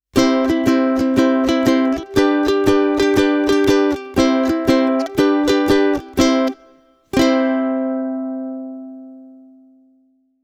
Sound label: concert ukes